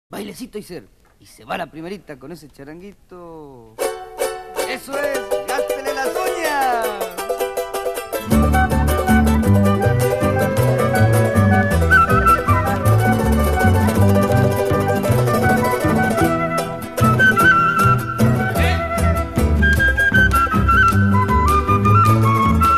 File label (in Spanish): cantando en mi valle, las flautas indias